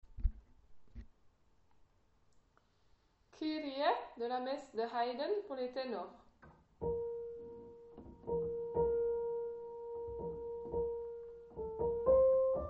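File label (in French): Haydn kyrie ténor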